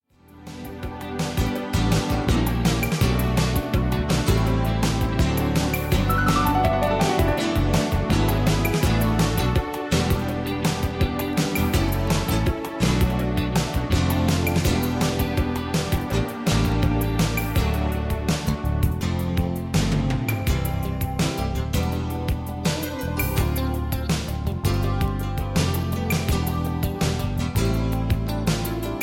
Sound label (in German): ein bisschen klavier